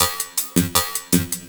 LOOP SD, Drum & Bass, 160 BPM

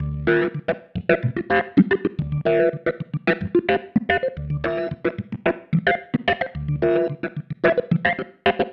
Pattern, Organ

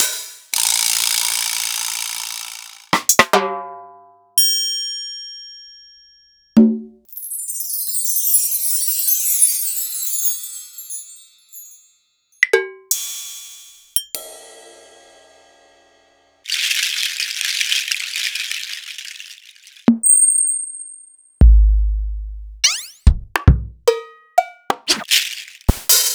Roland SPD-S; m8

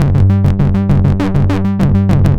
synth arp; Electrocution

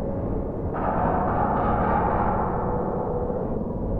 Noise Loop, sparse soundscape

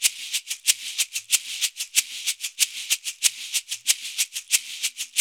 Udu Calypso

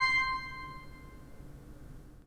PIPE, 3DOrgans

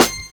MANDOLIN; Univers Sons - Kitch 70s

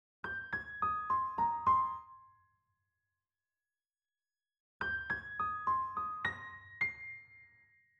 G#maj 105BPM Piano; 99Sounds Music Loops; PANDORA BOX!; Yacht Week; Monosounds

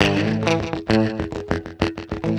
Guitar Loop; Guitars; Ambient Grooves

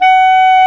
WND CLAR2, Clar Jazz

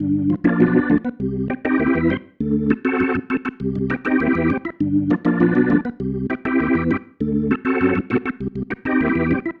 Progression, Organ, Loops

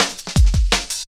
Drum Loops, Jungle, 166.5; Zero-G Jungle Warfare 1, 2 & 3; Breeze; 90 s Sample CDs

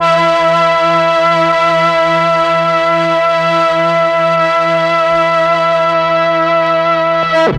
MEL STR, Legendary M400; ILIO; Propeller Island

